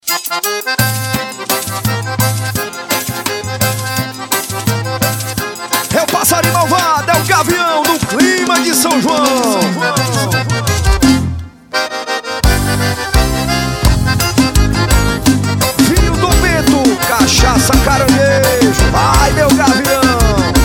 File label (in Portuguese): forro